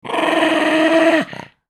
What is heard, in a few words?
mad dog